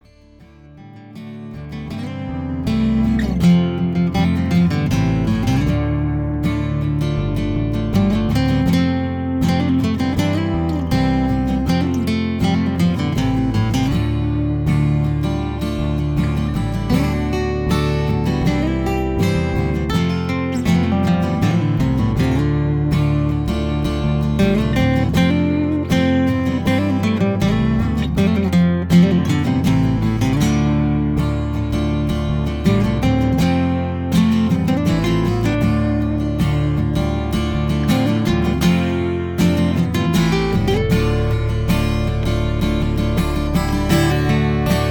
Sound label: instrumental sample